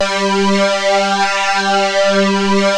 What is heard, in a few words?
SYN, analog